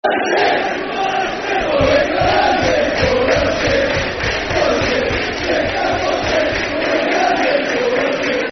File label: England Is Full Football Chants (Soccer Songs), Cardiff City; fanchants-com